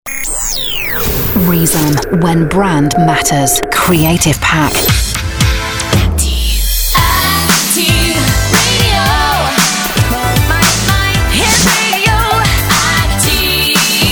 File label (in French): Full Sung Jingles; Activ Été 2K10; jingles activ radio france